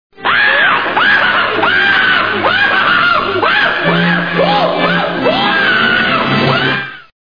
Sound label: scream; looneytunes